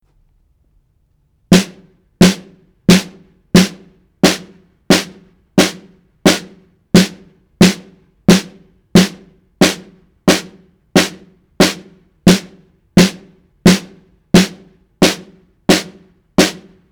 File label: drum phase